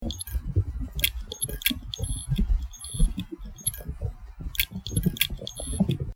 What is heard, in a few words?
hard drive clicking sounds